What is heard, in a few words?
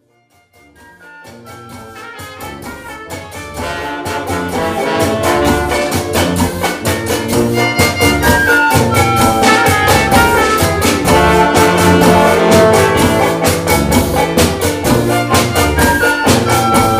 Cumbia Carnaval trumpet